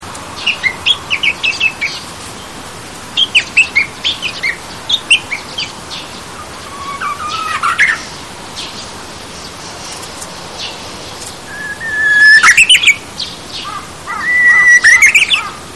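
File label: Japanese bush warbler; uguisu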